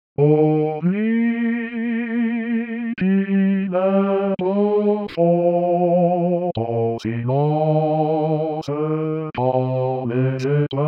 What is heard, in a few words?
Bas; Rameau; Nuit